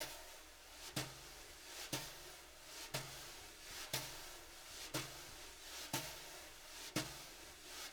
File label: BRUSH LOOPS, Living Drums